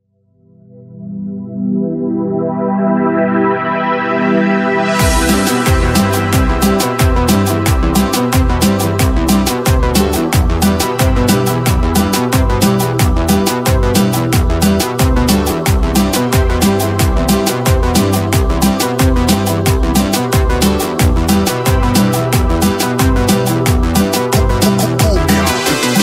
Cumbia

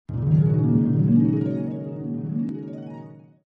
magic harp

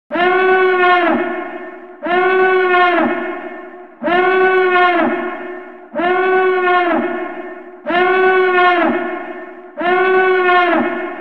Alert Alarm; Imperial; Death Star Alarm

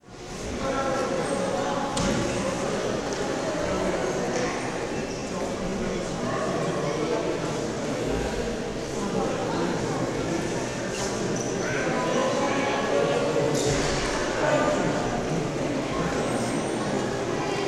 Big Hall Ambience

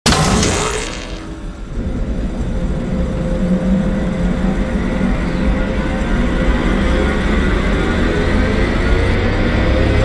laser charging sound; fallout 4 assaultron

